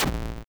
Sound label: smash